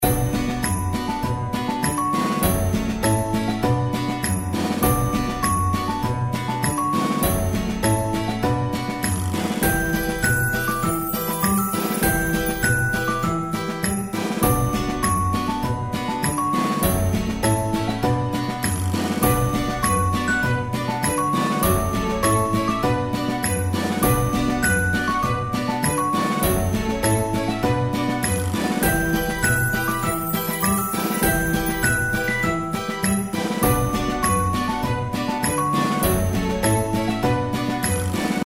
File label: reelspin; California Gold Rush